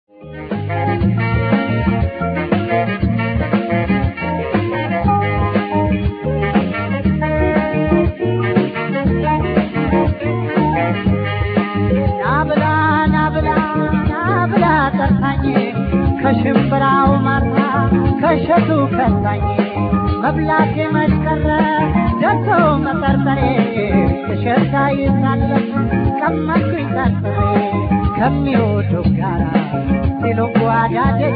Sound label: tango